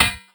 hammer; hephaestus; Crypt of the NecroDancer

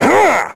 dorian hammer; Crypt of the NecroDancer

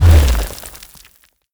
gargoyle awaken; Crypt of the NecroDancer

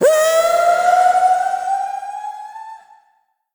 trapdoor; Crypt of the NecroDancer

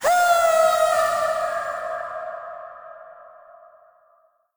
death; Crypt of the NecroDancer

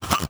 goblin toward; Crypt of the NecroDancer

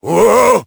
ice slip; Crypt of the NecroDancer

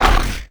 wallmonster attack; Crypt of the NecroDancer

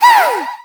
mommy spawn; Crypt of the NecroDancer

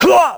squish; Crypt of the NecroDancer

